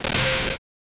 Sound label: creepy ui wrong answer; error